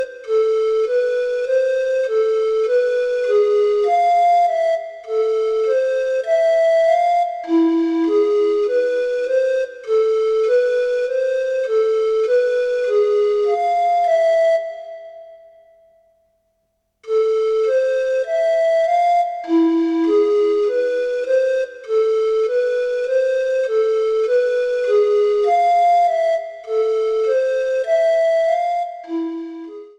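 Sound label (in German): Melodie zum Träumen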